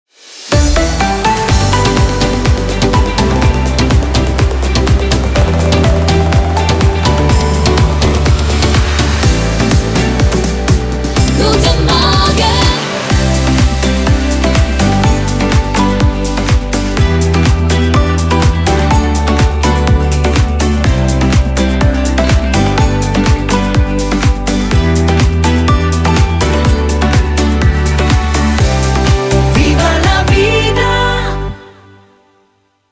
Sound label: Morning Opener; Das Inselradio Mallorca